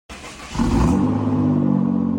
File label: engine start